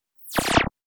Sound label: Flash laser